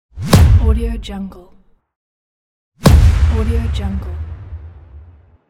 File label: level up